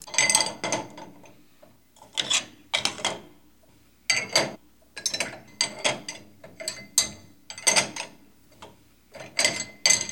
Sorting Cup of Glasses

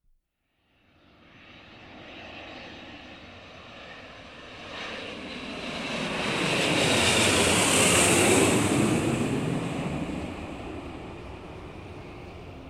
Aircraft approaching flying over

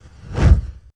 Flash Fire Ignite

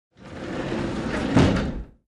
Sliding Door close

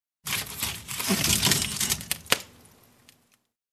Structure collapsing

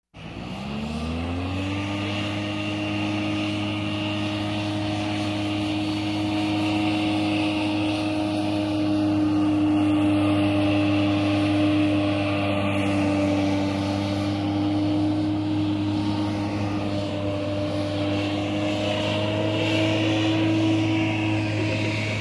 Boat driving around

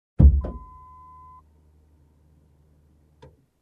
Opening Trunk

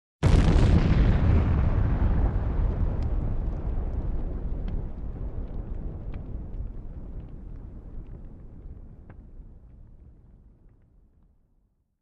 Huge explosion up-close

